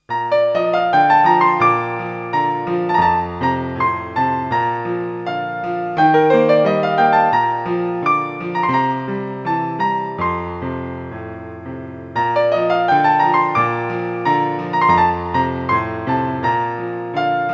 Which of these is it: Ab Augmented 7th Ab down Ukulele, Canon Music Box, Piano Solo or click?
Piano Solo